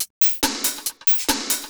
130 trance break, Drum & Perc Loops

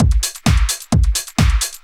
dr t 130 trance 2

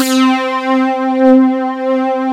TECHNO; Pro-Rec - Synthsonic; Z1 TECHNO

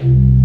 C-2, BOURDON PED, Organ

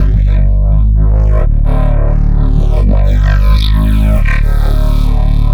173 Bass, Drum n Bass

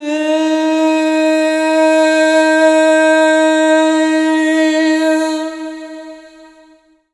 PAINF; Rarefaction - Poke in The Ear With A Sharp Stick

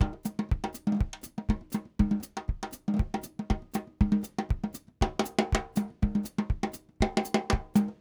Voodoo Groove, Latin Percussion; Sonic Foundry (Sony Creative Software); Joe Vitale Latin Percussion; Grooves

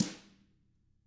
TAMA, Drums; ILIO; Double Platinum Drums 1

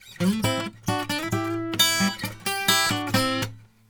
Blues Guitar; ILIO; Fretworks; LICK

